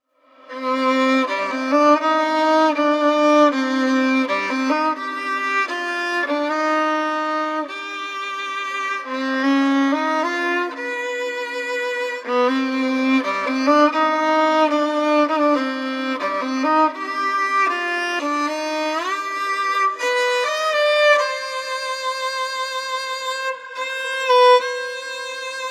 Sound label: Ghost Pirate Violin